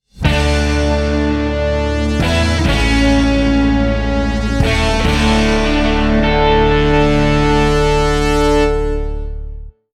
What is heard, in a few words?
fanfare bumper